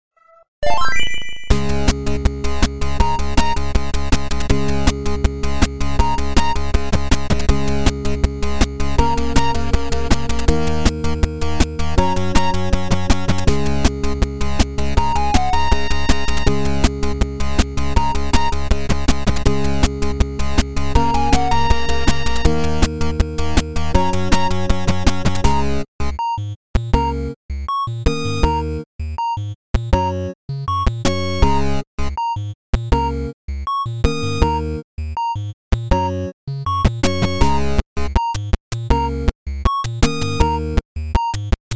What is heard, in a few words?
8bit mix; kickstart